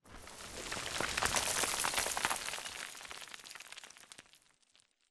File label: Explosion Rumble Exterior Dist Debris; Boomtown; Titanfall 2